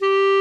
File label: CLARINET G4; Greytsounds; Scott Peers Sound Engineering